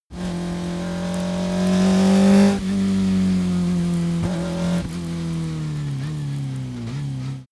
car audi 50 gt fast short acceleration deceleration